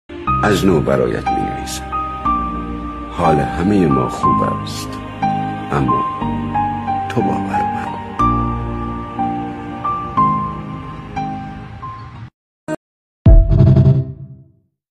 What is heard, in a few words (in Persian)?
music, آهنگ کوتاه غمگین ایرانی